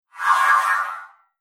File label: Production Whoosh; Andrew Fleming